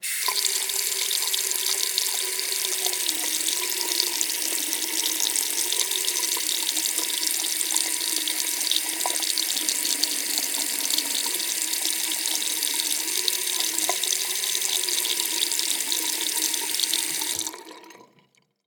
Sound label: turning on water in washroom